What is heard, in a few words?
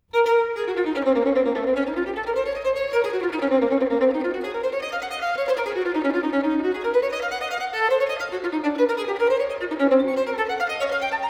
Partita in B minor, Violin Solo